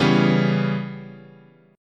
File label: C sharp Minor 7th Flat 5th, guitar chord